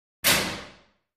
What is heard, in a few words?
Locker Room Metal Lo